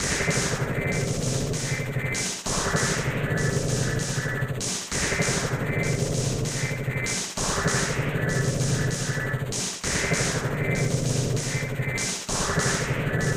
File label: Military Noise; Art of Fighting; SNES